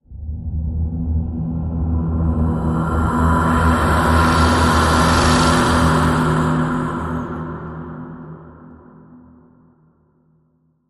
Breathless Ghostly Swell

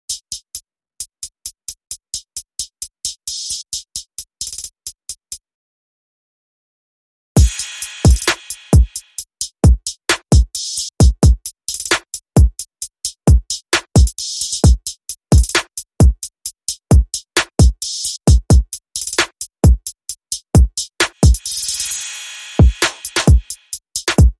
Drums Only

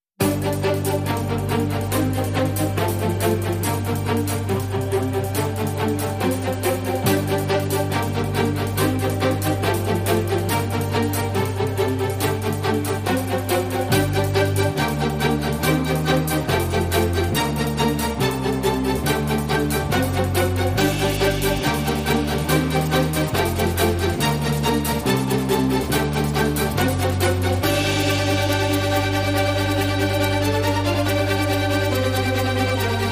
Straight Drums Mix

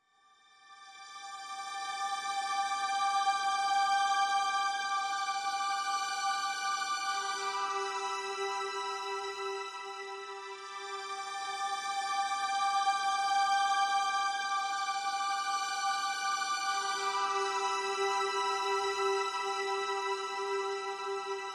Orchestral Underscore

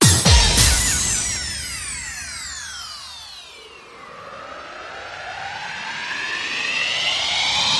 Electro Tech Ramp Build

